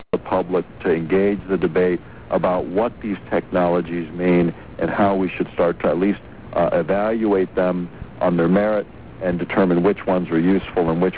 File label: harp